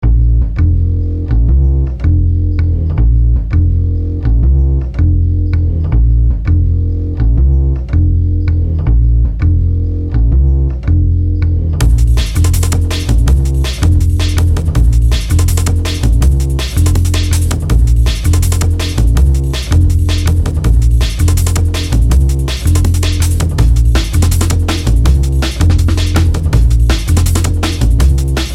chill on beach

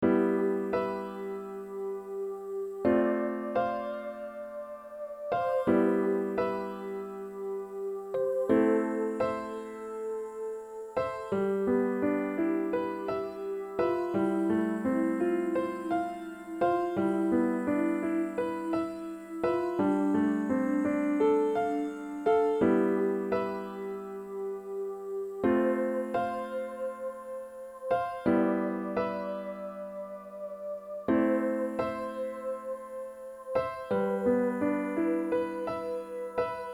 piano solo

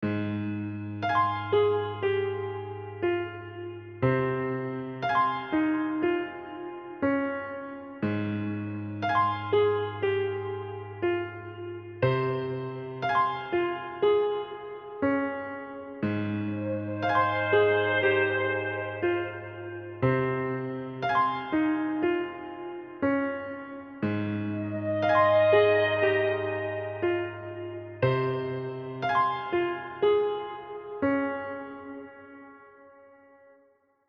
piano

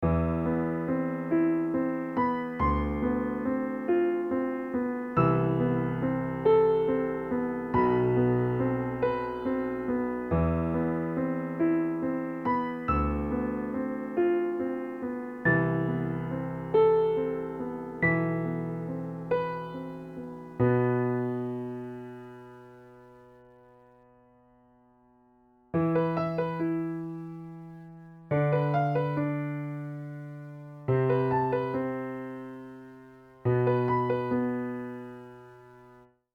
piano

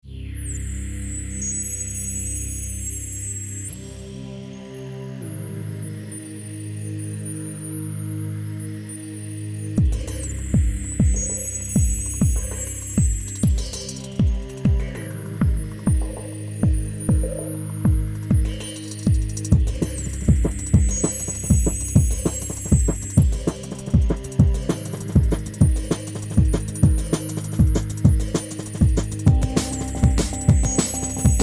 dnb spheres

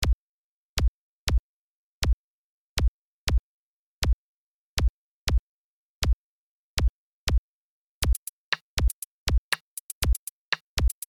electro

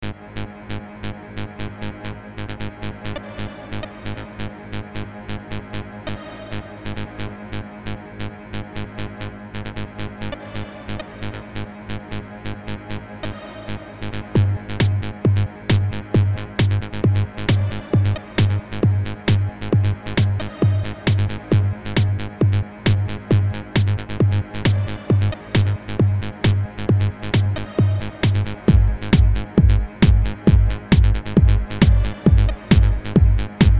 electrohouse

